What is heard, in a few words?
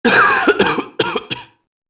mobster noise; enemy; Max Payne